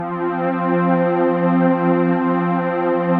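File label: chord pad, Pads; Best Service; XX Large - Pads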